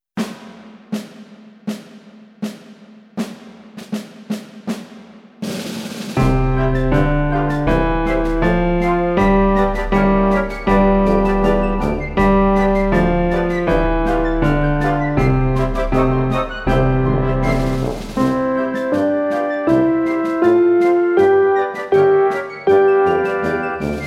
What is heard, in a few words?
C D E F G March Slow, piano